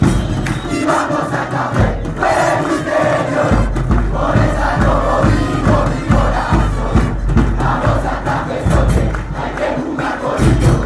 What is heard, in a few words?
come on santa fe, chants